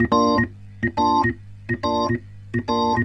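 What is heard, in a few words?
organ, B-3, 90 bpm, roots reggae; Sounds Good - Roots Reggae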